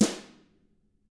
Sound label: TAMA BR F, Drums; ILIO; Double Platinum Drums 1